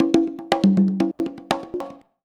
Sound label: CONGA 119, Modern Percussion Loops